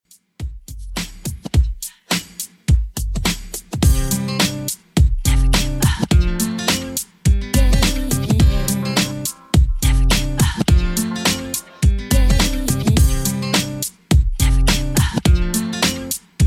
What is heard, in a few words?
2ks Redrum 105bpm CLEAN